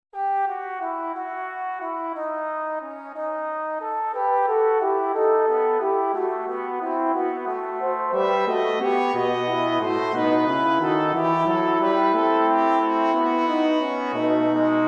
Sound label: 3 part e min brass